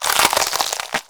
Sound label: Limb Stretch; Warframe; Infested; Emissary Ancient Tank